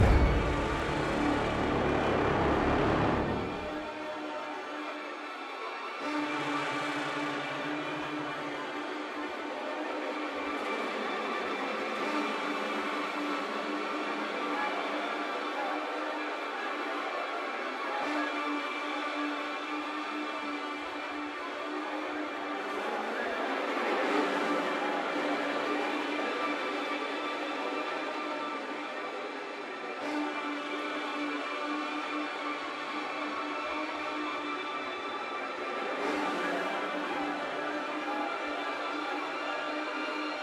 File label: Shockwave Music; bramble the mountain king; ps4 ps5 switch windows xbox one xbox series xs; Naecken